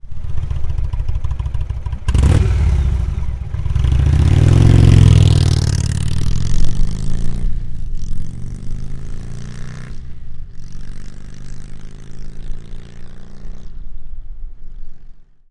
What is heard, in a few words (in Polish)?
indian scout dzwiek ukladu wydechowego slip on podczas ruszania; Rinehart racing